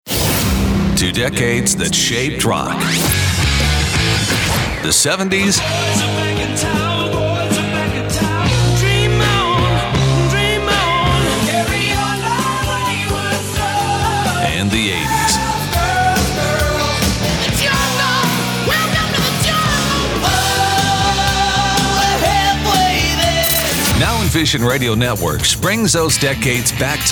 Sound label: Rock